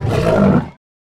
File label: african lion cry